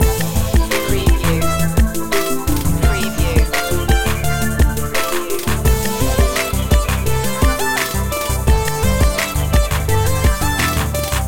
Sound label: radio hip hop loop